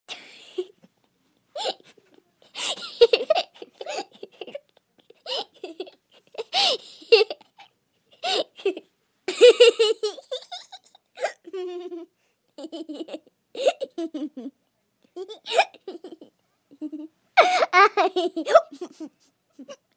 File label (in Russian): hihikaet rebenok